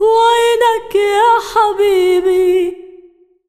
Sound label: SINGER, ArabianTraditions